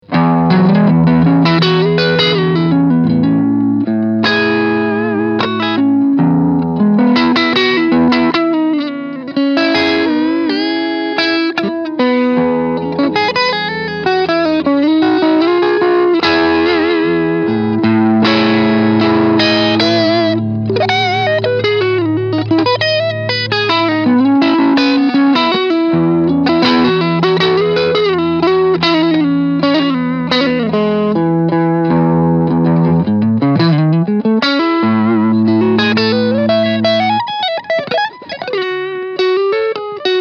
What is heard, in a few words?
Vox Mini Superbeetle Audio Medium Gain Overdrive